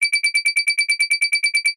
multimedia game sound jewel treasure or glass tally fast